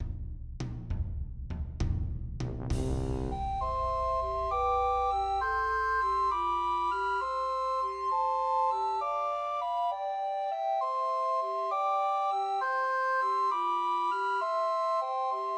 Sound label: I Saw Three Ships, Christmas Trios for Two Descant and One Treble Recorder